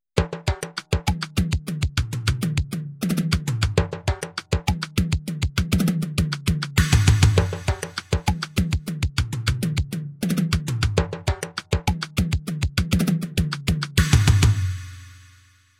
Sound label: drum pattern